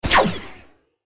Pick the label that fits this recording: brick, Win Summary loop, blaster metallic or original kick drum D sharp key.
blaster metallic